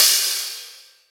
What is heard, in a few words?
huge urban crash cymbal single hit c sharp key, Royality free crash single shot tuned to